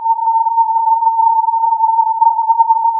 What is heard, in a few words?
filtered input